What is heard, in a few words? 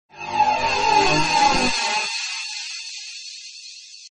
Phase Change; ringtones; iPhone3000